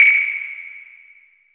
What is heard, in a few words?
sonal ping, Misc Percussion Clavas